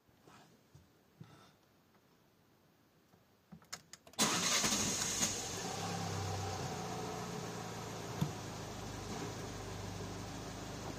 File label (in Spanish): habitar coche